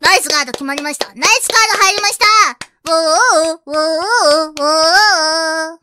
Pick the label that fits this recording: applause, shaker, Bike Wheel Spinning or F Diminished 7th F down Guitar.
applause